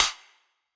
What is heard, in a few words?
tap